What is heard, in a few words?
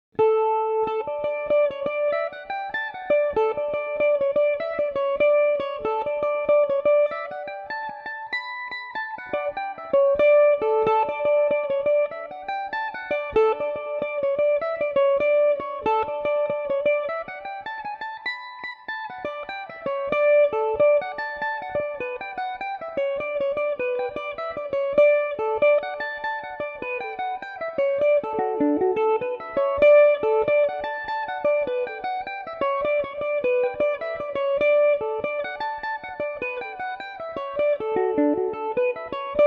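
D major revelation, irish mandolin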